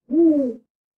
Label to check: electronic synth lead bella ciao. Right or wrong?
Wrong